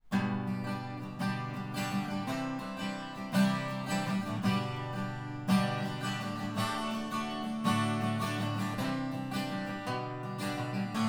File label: Spaced Omni Guitar; Four couples of microphones have been placed in front of some instruments